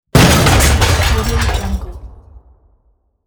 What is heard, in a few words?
cartoon animation explosion